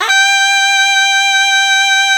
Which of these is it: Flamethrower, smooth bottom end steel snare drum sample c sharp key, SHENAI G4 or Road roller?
SHENAI G4